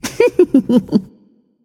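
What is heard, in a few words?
nonverbal heh heh; smite; voicepack; izanami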